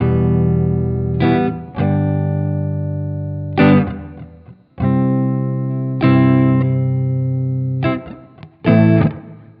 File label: Xmass Guitar Loops 100bpm Emin; Guitars; Ghosthack; Advent Calendar 2019